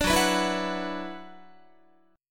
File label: Gb 7th Suspended 4th Sharp 5th Gb Guitar; Gb7sus4#5 strummed